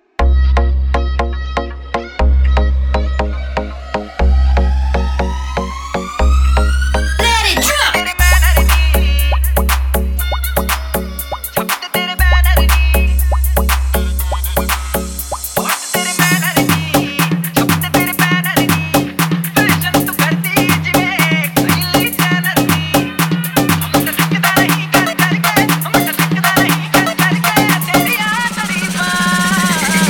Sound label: Hard EDM Bass House Remix; Laxmmi Bomb; Dj Anurag BaBu Jaunpur